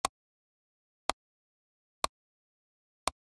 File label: Generic Click Plastic Tiny